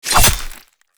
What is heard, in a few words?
knife